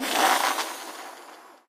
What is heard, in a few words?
player enter jump paint